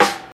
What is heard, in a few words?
short snare drum sound g key, Royality free snare tuned to the G note.; snare drum samples